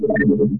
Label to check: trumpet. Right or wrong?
Wrong